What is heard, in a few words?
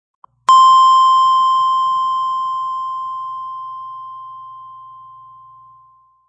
Long Beep; Beeps; Multimedia